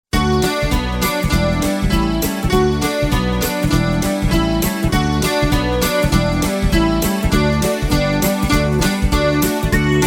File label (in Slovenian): D dur; Malé kotě